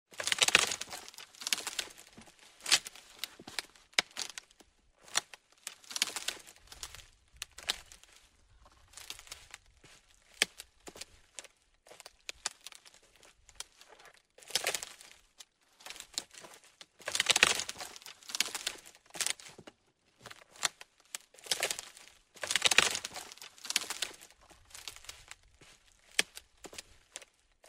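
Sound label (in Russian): stepping on branches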